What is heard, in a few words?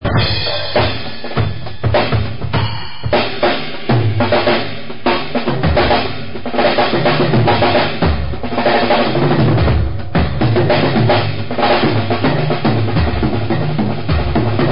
drum solo